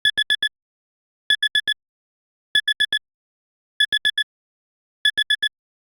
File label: smartphone ui common alert clock alarm; just sound effects; alarm sounds